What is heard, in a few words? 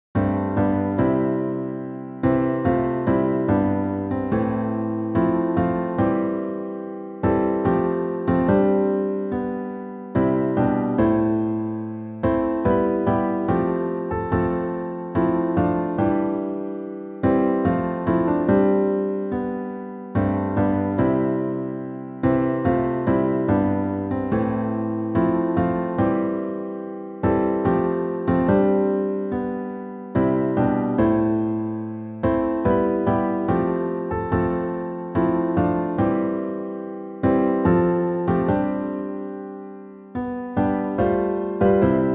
piano